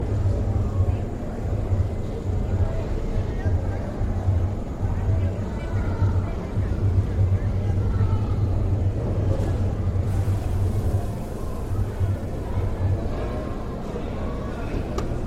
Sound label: Factory Ambience